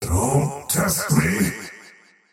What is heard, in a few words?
cast pain; dota; faceless void